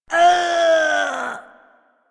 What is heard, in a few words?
Injured Grunt